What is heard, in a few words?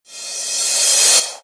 reverse, FX; Vengeance Samples